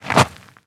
foot scrape

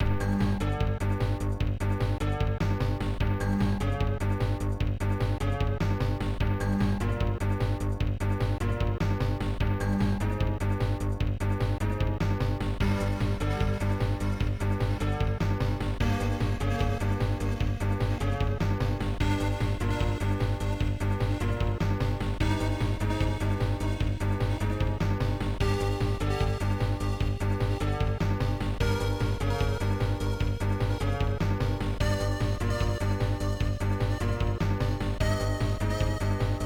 Jetpack; Shadow of the Beast soundtrack 2016

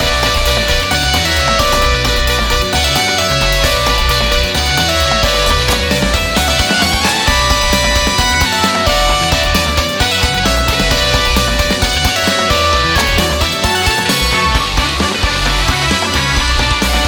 Loop Dramatic Boss; Baboon

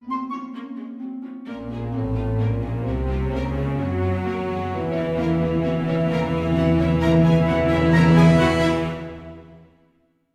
Music Future House; Costume Quest 2

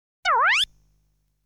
Flying enemy appearance sound; legend of hero tonma

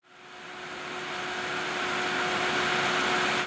Weeen

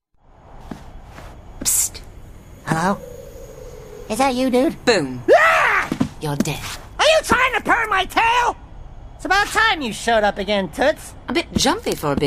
Sprayer upgrade (cinematic); Daxter; PSP rip

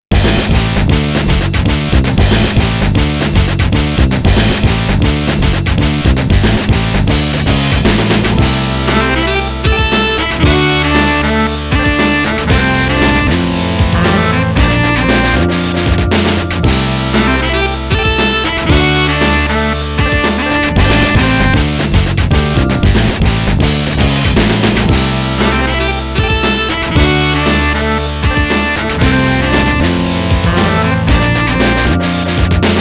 Stormy Saxophone; the king of fighters 98 ultimate match

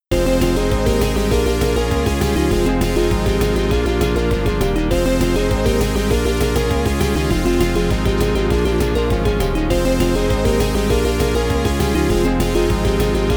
Metal & Prison (FM)

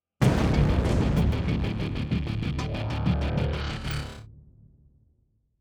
190bpm; Legends of Pegasus; windows gamerip 2012

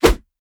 Spear throw

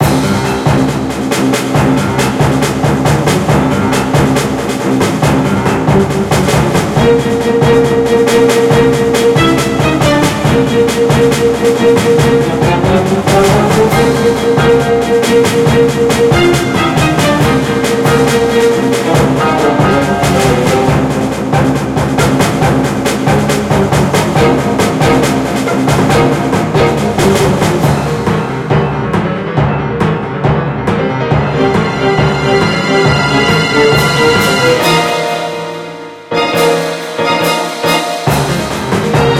Dungeon; Chroma Quaternion

